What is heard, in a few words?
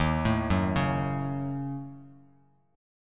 D sharp Minor 7th Flat 5th D sharp, down, Bass Standard; arpeggiated; JGuitar